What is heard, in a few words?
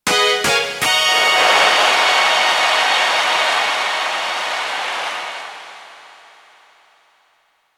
All Stage Clear; assault chainguns km switch gamerip 2020